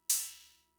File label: Cymbal; Fricke MFB-522